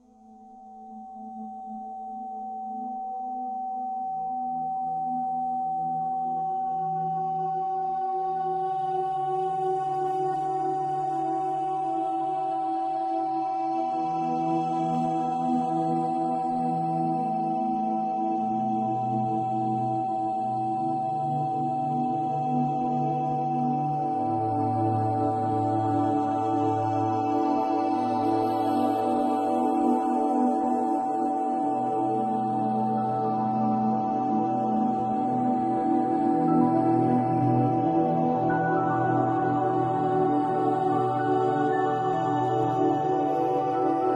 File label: 763hz; thyroid function